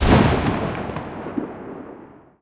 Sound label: mg 8mm mg34 lastshot; guns; tanks; War Thunder